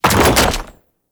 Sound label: Archgun React; Warframe; Weapons; Archwing; Tn Concrete Archgun